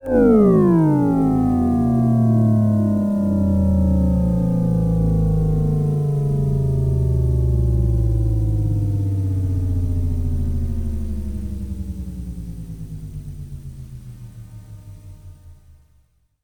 Space engine Stop

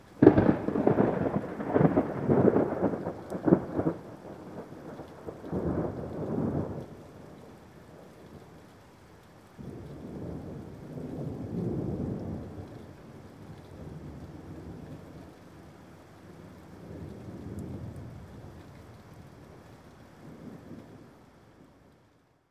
Exploding Thunder With Resonance

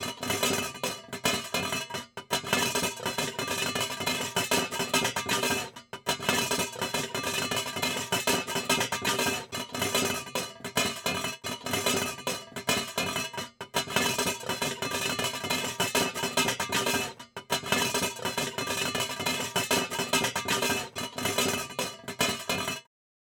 Rattling Pot

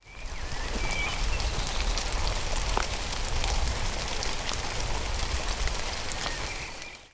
Rain Day In Nature

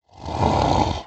Monster

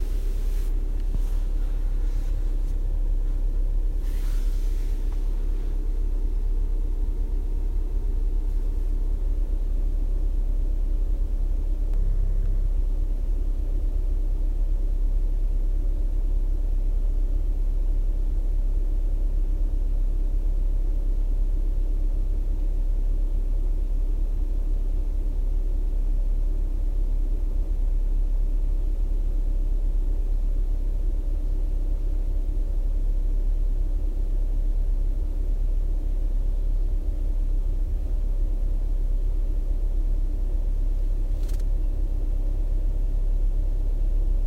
White Noise Sound Effect

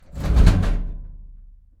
Metal Cabinet Shove

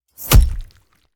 Nasty Knife Stab

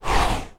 Swoop

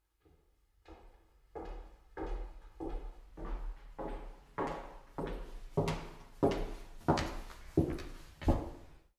Woman Walking High Heels

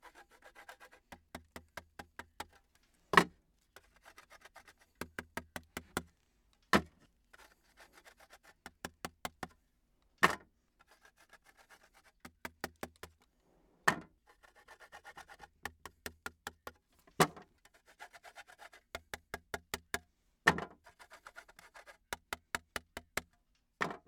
Grating Parmesan Cheese

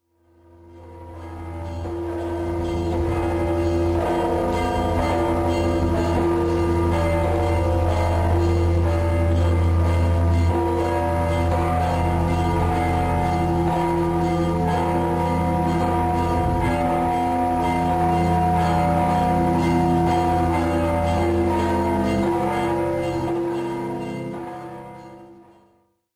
Toom kirk bells

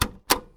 Button Click

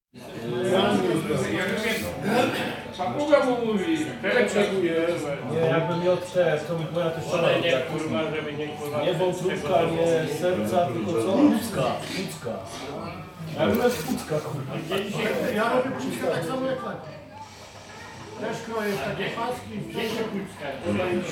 Bustle In The Pub

